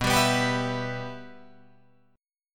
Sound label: B Suspended 4th Sharp 5th B Guitar Drop D